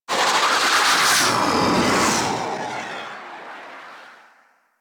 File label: Fighter Ship Attack Maneuver D, Space Fighter Ship Engine; Warframe; Railjack; Enemy Ships